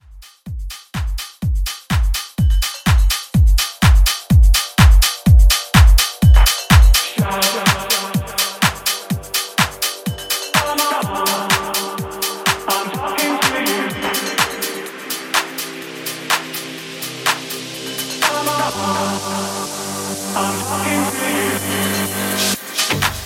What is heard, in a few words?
Tech House Intro